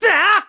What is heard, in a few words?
Sword Spin; Link; zelda sounds; Skyward Sword